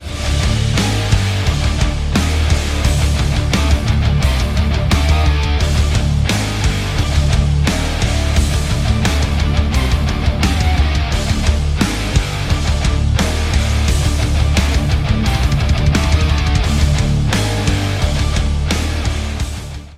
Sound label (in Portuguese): IMPACTO; pack de tilhas e efeitos 2.0